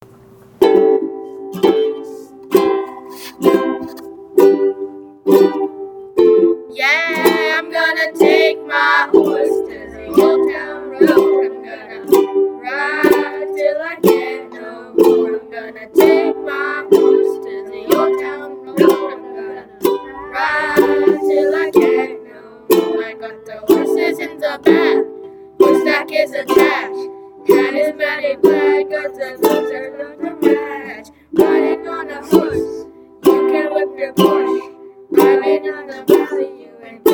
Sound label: Uke